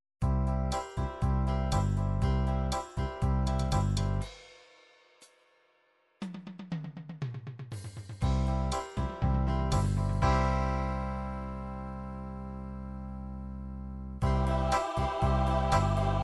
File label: Ballad 8beat, midi